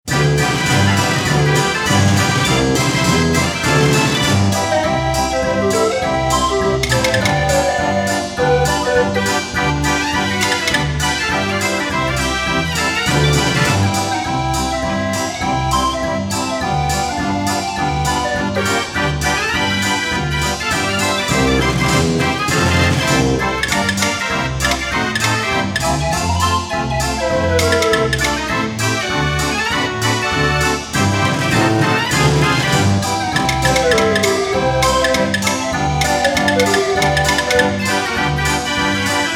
draaiorgel; de klok el capitan

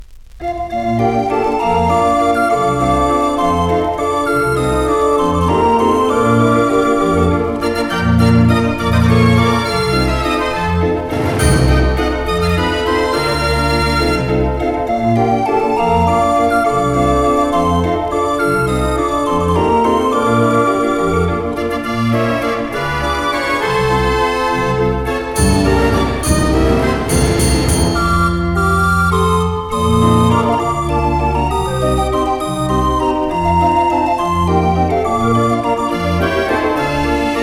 Walzertraum, draaiorgel; drie pruiken

